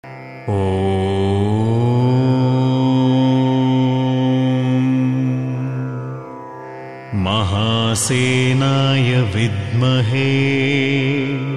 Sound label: subramanya skanda gayatri